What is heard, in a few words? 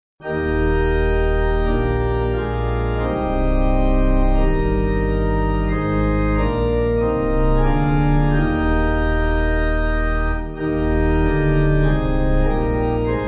Pipe; Abide with me